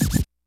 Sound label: HITS BITS; Kid Nepro - Elite